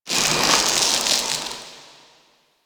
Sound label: Ice Close Ice Break Small; Ambience; Warframe; Corpus Ice Planet